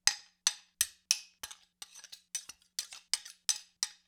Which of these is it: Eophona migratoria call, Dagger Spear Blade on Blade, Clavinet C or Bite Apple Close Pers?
Dagger Spear Blade on Blade